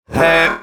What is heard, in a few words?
Grunt Attack; Grover; paladins